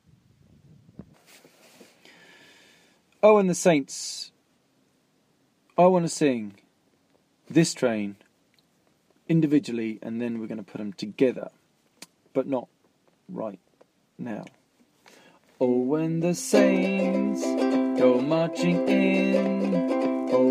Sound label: Gospel Medley